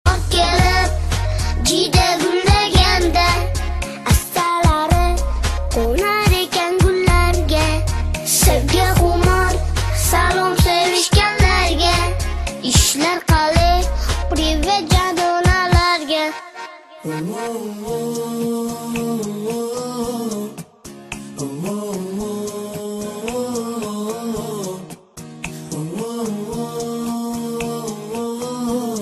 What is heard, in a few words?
Uzbek Kids Singing